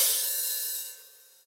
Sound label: verby crash cymbal single shot g key